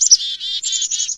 chicka, forest; ambient; source engine; ep2

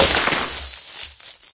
tree jump; ambience; Source Engine; dod

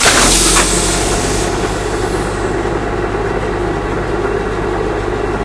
fuel truck engage; Left 4 Dead 2; Source Engine

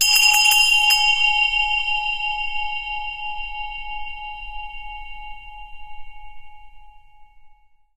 zen bell; Source Engine; tf; japan fundraiser

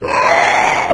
stalker alert; npc; source engine; episodic

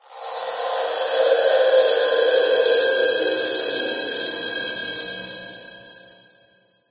amb random tech; source engine; portal2

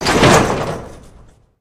test chamber door old open

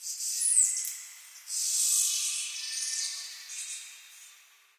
amb african birds; source engine; portal2

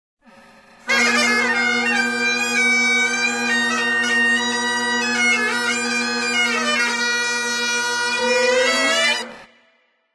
badpipes, taunts; Source Engine; tf

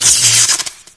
new spark, energy; source engine; ep2

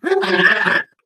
ballbot drowning

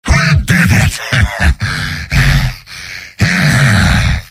demoman cheers; mvm

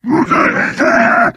charger lurk, voice idle

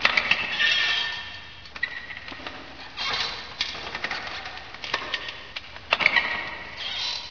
squitch, ambience

